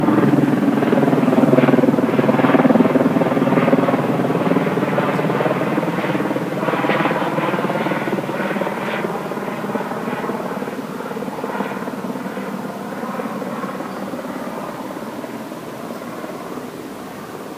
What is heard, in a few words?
Helicopter